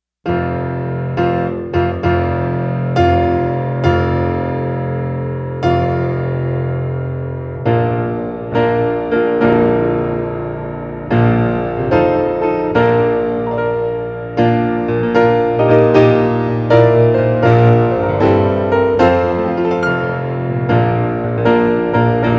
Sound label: bridal chorus